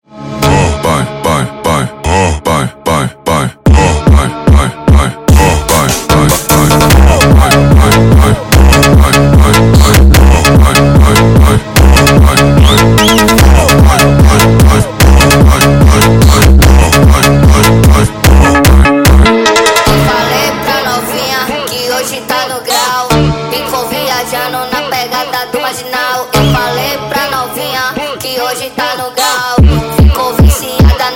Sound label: Funk sped Up